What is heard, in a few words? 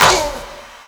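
CLAP left; USB Soundscan vol.33; AKAI; Disjointed Textures; DRUMATIO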